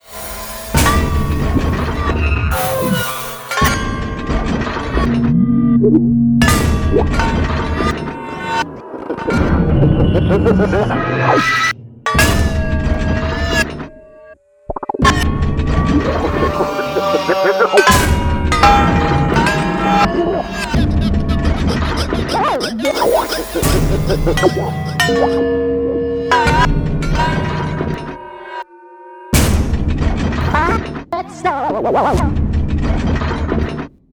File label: Ambience; Golden Freddy; Five Nights at Freddys; FNAF Special Delivery; android ios